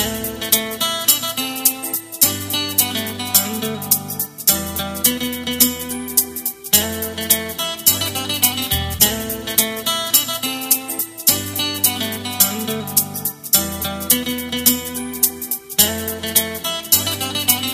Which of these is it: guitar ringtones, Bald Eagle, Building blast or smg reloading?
guitar ringtones